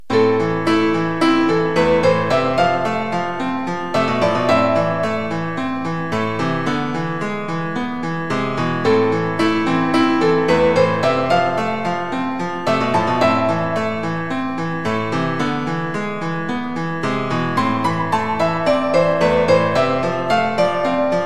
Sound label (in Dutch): Klaverting midisynth